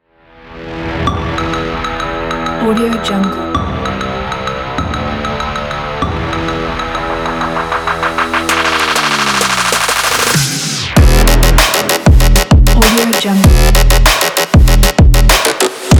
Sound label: for this trap